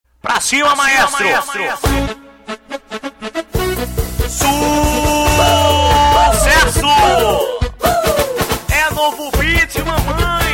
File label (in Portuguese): novo beat; passando som